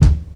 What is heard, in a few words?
natural kickdrum d key; Mono